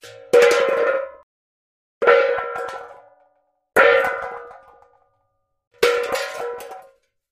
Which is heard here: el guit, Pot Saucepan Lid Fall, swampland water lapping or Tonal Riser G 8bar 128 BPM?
Pot Saucepan Lid Fall